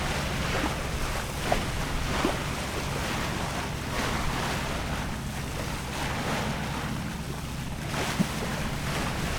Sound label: Splash under boat; RadwinIsland